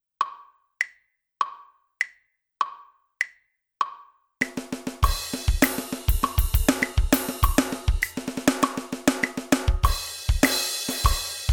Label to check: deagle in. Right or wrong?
Wrong